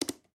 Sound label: Button Hammer Start; Game rip; MiSide